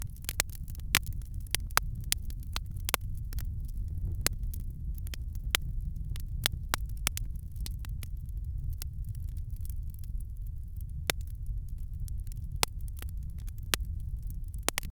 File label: campfire crackling, camping